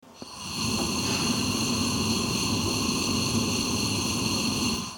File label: blowtorch